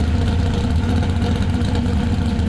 ralenti v8

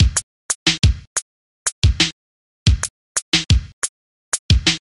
golpearon

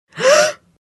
susto mujer

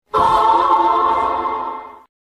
notificacion; re zero

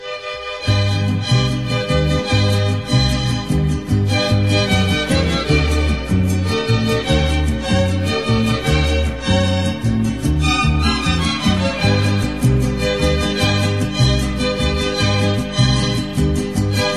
la cucaracha

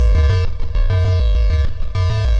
tambor

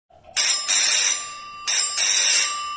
campana

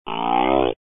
juguetes juguete

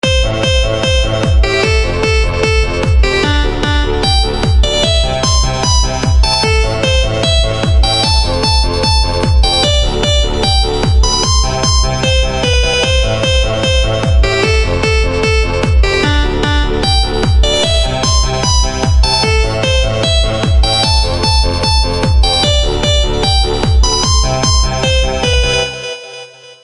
bajo

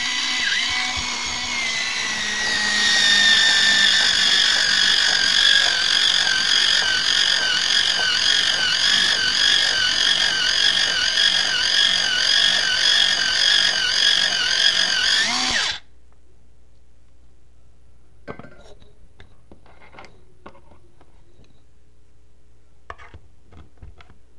electrico

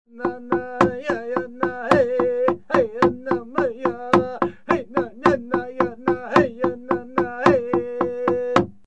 Danza india

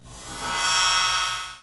boton

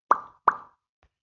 whatsapp burbuja doble

